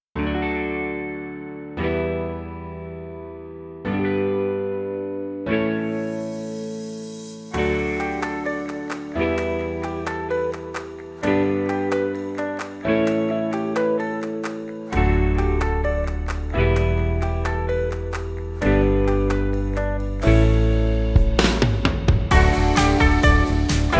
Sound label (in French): musique d illustration; 123 Parebrise